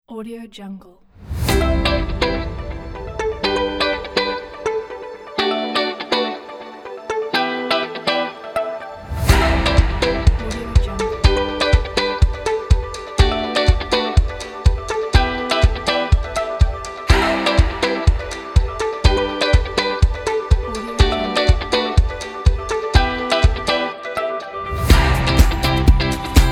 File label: energetic upbeat pop uplifting inspiring